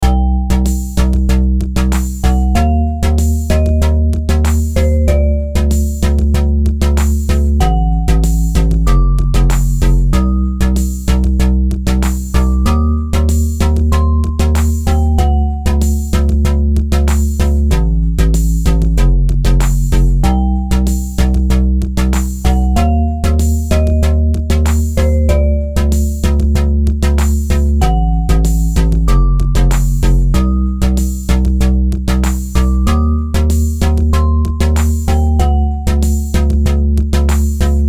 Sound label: beat